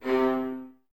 String Ensembles, Violas, VLS Detache

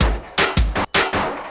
Drum & Bass, 160 BPM

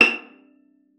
VIOLA FINGER; Xsample VOL-5

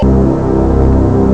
mega chord; Amiga Soundtracker Sample Packs

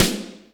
D3H-SNR; Zero G; Man Machine

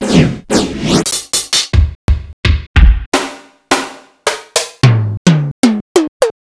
Ensoniq EPS

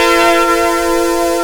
CHORD SYNT; Big Beat Samples & More; AKAI; FX LOOPS KIT

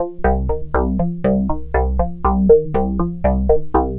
Synth, Synth Textures

